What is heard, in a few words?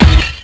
Glass Kick (13484Hz); Sonic 3; M8; Genesis